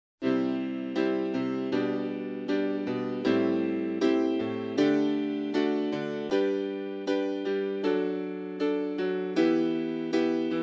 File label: mixed bag prelude